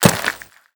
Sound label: foot glass dirt, footsteps